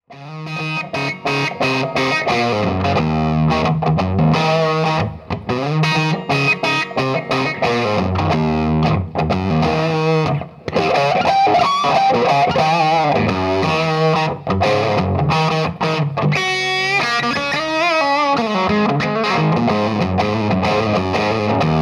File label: Dirty